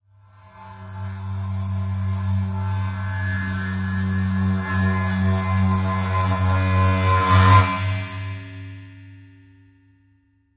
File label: Splash Cymbol 1 Bowed 4